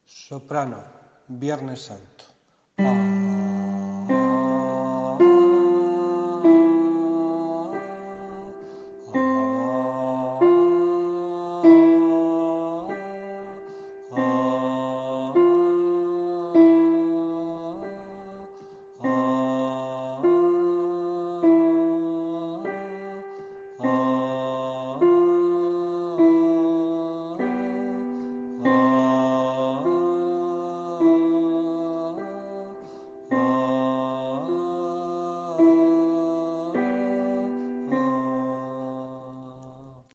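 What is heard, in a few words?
soprano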